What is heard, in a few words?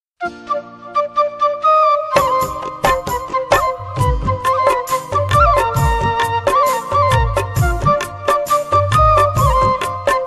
flute ringtone